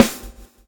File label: swing